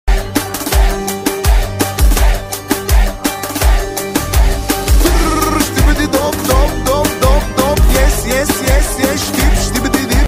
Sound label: brr shikiri bi dop phonk